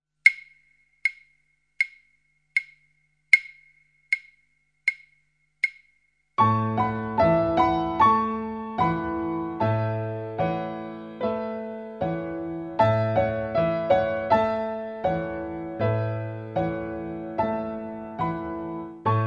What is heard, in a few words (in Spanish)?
Linternas chinas piano